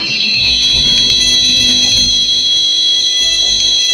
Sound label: thunder; ambient sounds